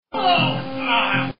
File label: pain